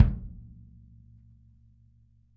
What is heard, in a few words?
kick